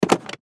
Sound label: Button Multimedia; Web Interactive; Buttons; Technology; Blastwave